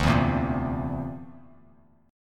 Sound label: D 7th Suspended 2nd Sharp 5th D, Bass Standard